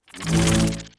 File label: Break Mono Jolt